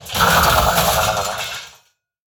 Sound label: Spring Rocket Death